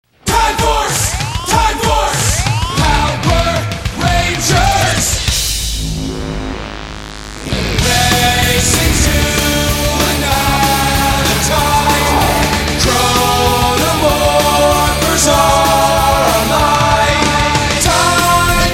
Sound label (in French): musique generique